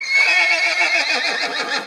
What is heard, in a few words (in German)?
Geräusch Von Pferden